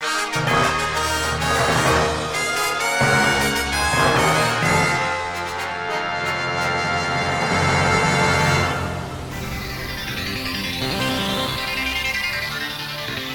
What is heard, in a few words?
battle champion; pokerogue; bgm; geeta